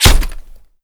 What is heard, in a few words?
impact helmet